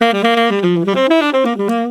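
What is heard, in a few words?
Bass Mute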